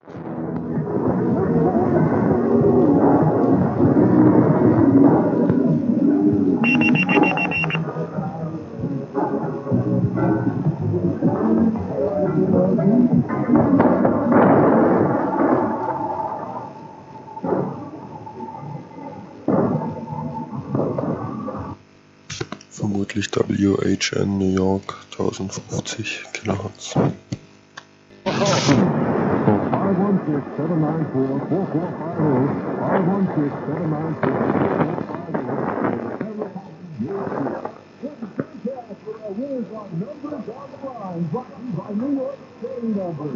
mwdx 1050 khz; wevd new york

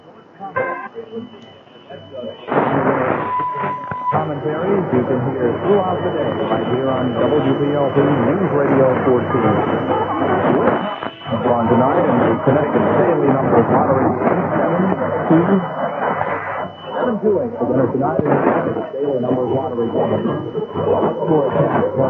hartford 1410 khz, mwdx; wpop

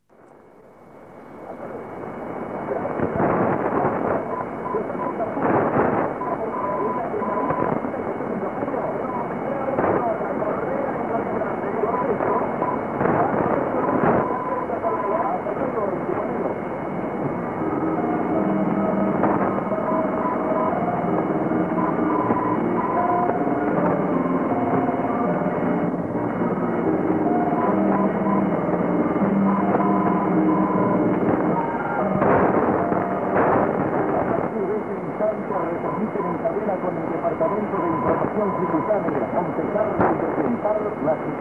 monte carlo montevideo 930 khz, mwdx

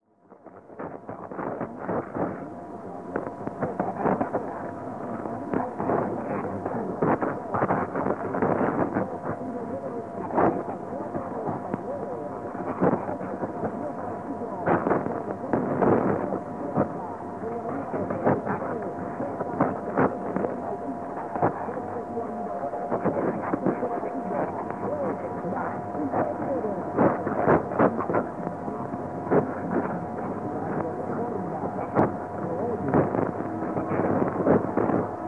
mwdx 860 khz; r reforma chitre

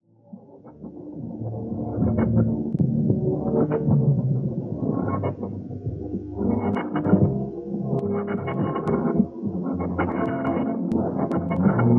tpdx 4780 khz; lv de carabobo valencia

